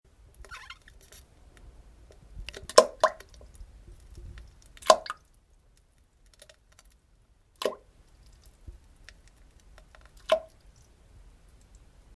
普通うんこ 大きめぽっとん音

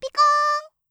ピコーン

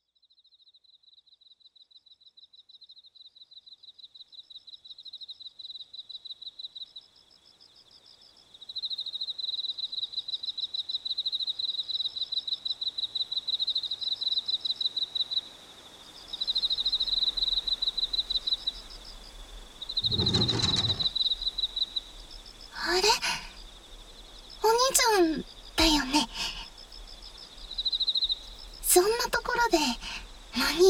癒しの濡れ綿棒・ふわふわ梵天耳かき～ソフト耳舐め, ASMR

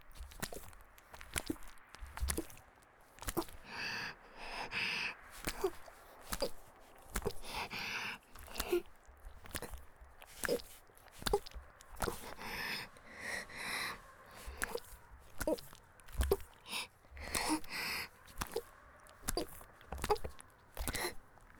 (木枯らしバージョン) ギシアンループ; 田舎の別の無感情少女と自己犠牲愛

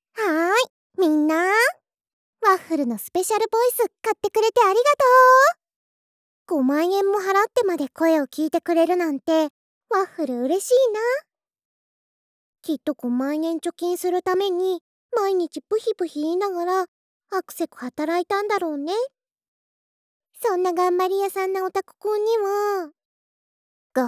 媚び媚びウソ喘ぎＨボイス; スペシャルボイス

